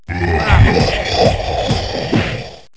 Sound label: LAUGH; PoolDisk Too; JAGUDOME